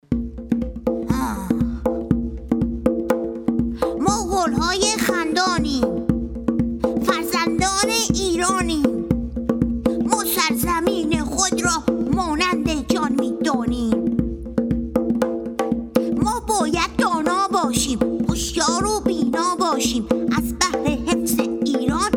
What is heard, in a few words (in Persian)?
فرزندان ایران